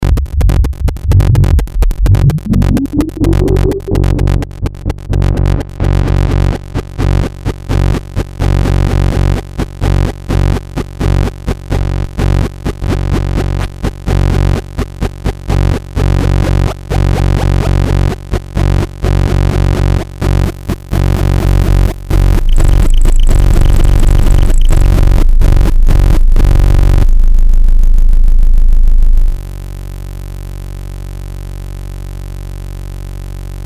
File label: noise, korg ms20 synth